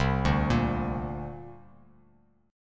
C 7th Suspended 2nd, C7sus2 chord; arpeggiated

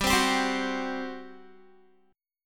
G Major 7th Suspended 4th, Guitar; GM7sus4; strummed

